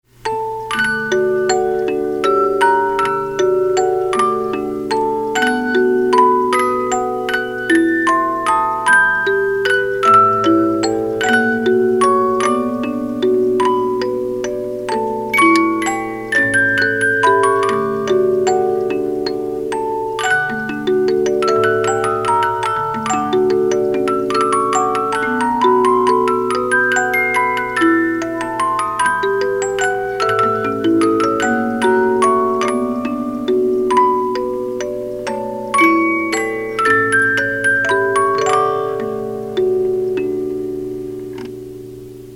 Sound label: Nocturne Op 9 No 2, 50 Note music box movement